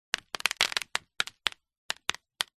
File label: nature water drips sudden short paper sheet